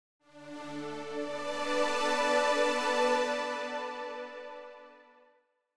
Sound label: quizcatch